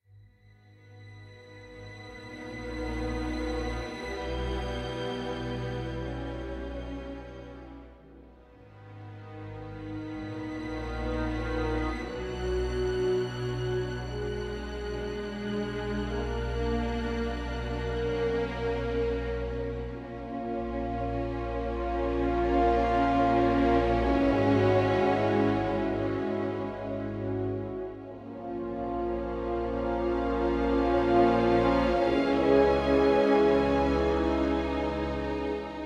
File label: BGM Start; Babylon's Fall